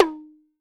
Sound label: toy bongo; Voice Crystal - Steve Reid Definitive Percussion; BONGOS